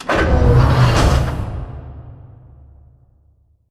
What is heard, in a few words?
Robot Arm Fold Plate; Boomtown; Titanfall 2